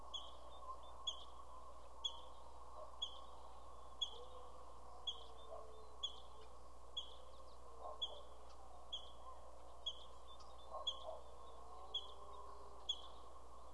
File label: Squirrel Warning Chirp; Garden